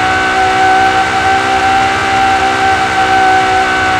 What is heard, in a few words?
mid, vehicles laferrari; tralalelo ferrari; sim fphys fer laferrari